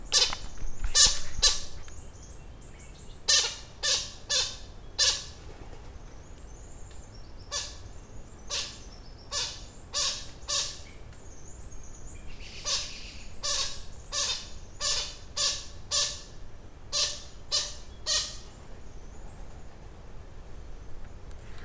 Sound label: Visayan Hornbill Penelopides panini